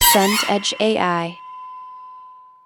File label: sting horror